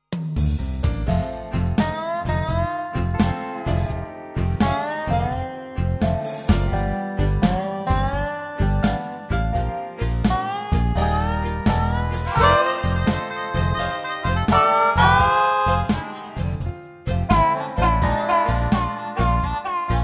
jam; delta

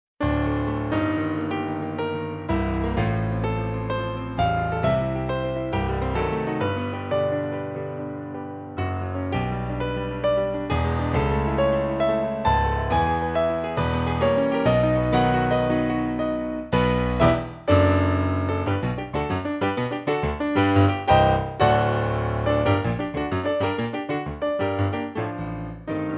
concert grand

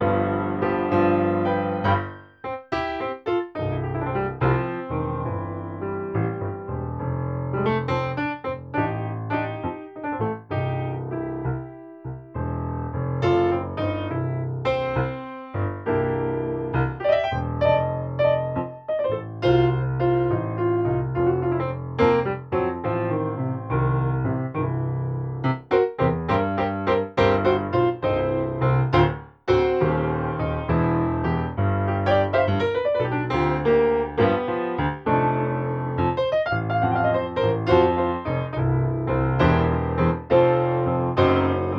Pianist Boogie

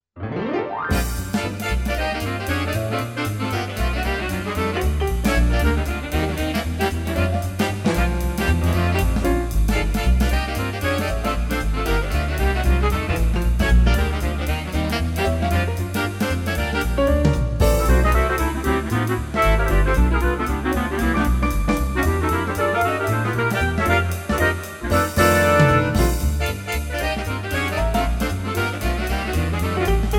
woodwind

